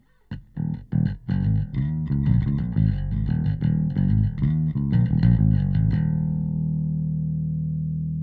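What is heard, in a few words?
bass preset Phrase Bass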